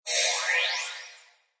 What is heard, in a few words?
Magical Shimmer Flange, Magic sound of casting spell, conjuring or wizardry; Magical Shimmer Flange: Magic sound of casting spell, conjuring or wizardry. Witchcraft ritual summoning elemental or otherworldly; Magic Sound Effects; Lynne Music